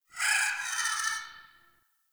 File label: Tree Critters Angry; Duviri